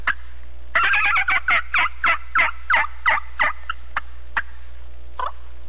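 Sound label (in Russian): индейка одиночный скрипучий близкий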